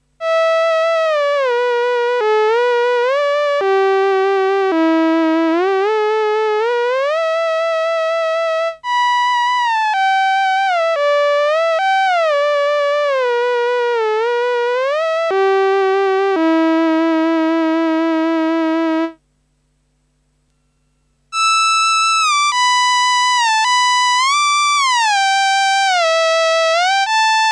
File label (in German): Teisco S100P CELLO VIOLIN mit Portamento